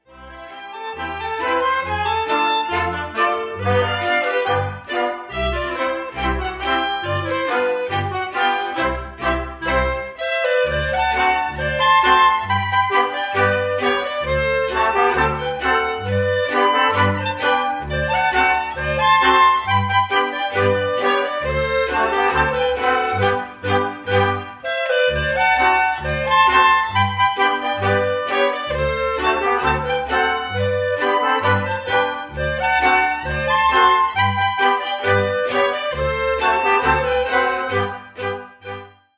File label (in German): Bayerisch Polka